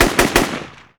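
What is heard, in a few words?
AK-47 exterior shots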